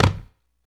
STAM, Human Perc, Body Perc

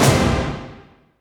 D2 STAB; Zero G; Man Machine

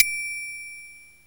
TRIANGL FX; SFX

